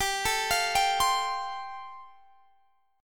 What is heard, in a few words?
A-Minor 7th Sharp 5th G